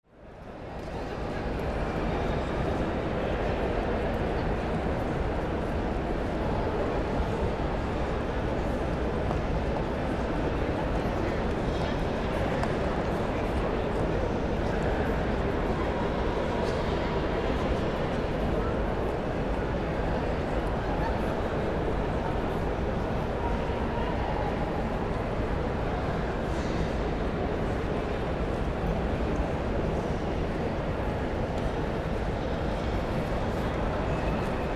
museum hall atmo in uk

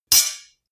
knives large scrape hit

